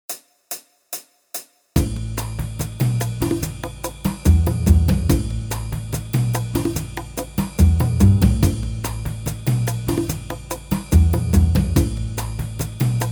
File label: Jazz backing; Guitar Techniques 2018 Issue 289 December